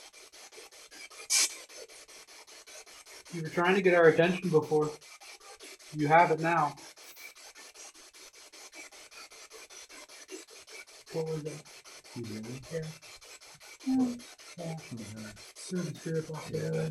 Basement Spirit Box; judson house investigation

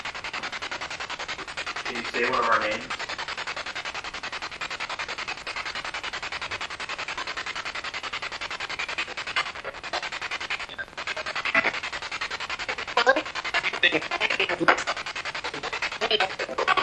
Spirit Box; 2nd Floor Hallway

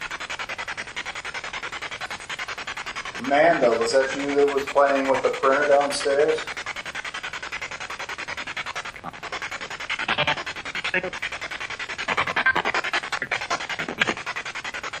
Spirit Box; 2nd Floor Bedroom

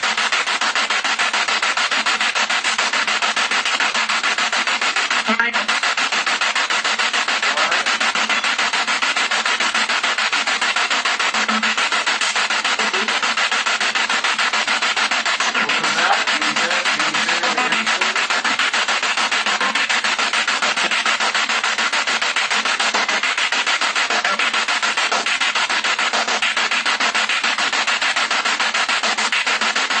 Kitchen Spirit Box

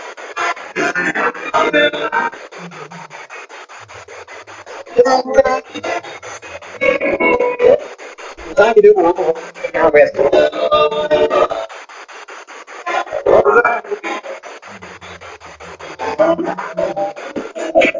Spirit Box; Chapel; andrew bayne memorial library follow up investigation

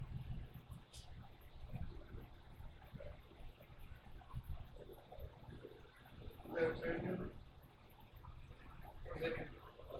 Barber Shop

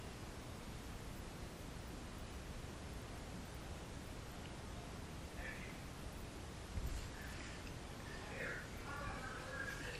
2nd Floor Stairwell EVP

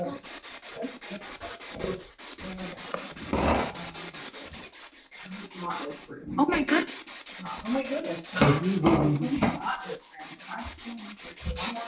Walking to Vestibule Spirit Box

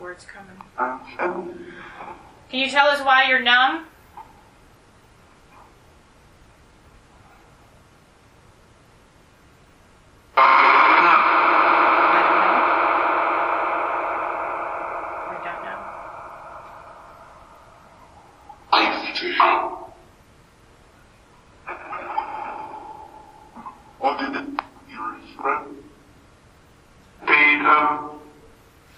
Living Room Phasma Box; private residence freedom road apartment investigation return

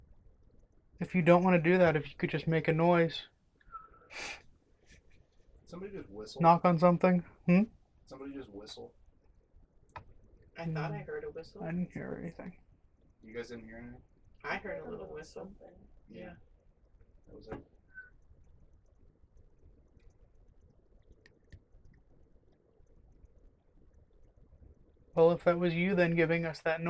Library EVP; wexford general store antiques investigation

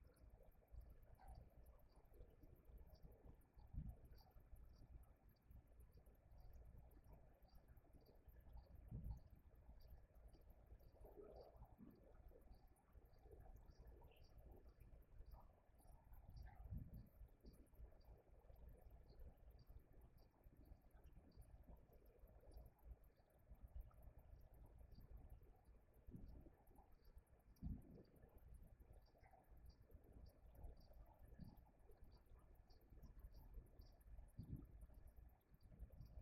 Townsend Room Static; Irish-Townsend House – Investigation

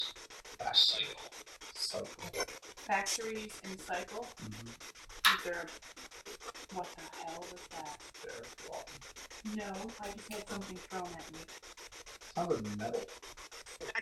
Indian Head Room Spirit Box; wexford general store antiques investigation

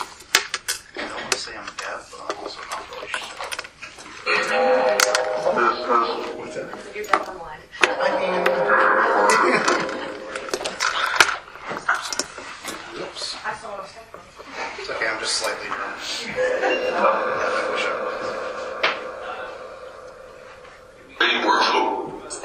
Room 409 Downstairs Phasma Box

